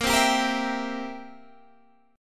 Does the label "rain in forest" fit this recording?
No